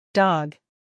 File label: dog